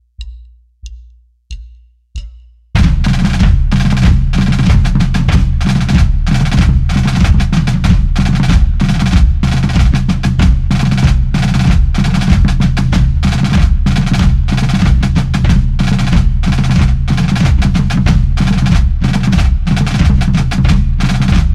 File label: Cadence War Drums